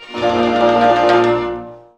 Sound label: ZIMB HIT; Best Service; World Colours